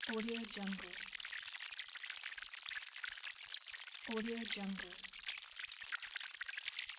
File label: digital drips